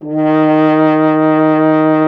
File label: F. Horn; Miroslav Vitous; Solo Instruments VOL-2